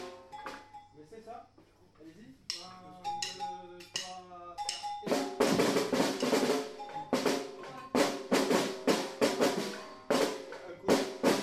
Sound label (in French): afoxe caisse claire, batucada